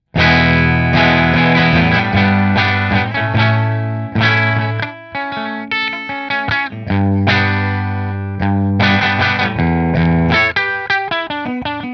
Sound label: Position 3 Fender; Showmaster; Fender Custom Shop Showmaster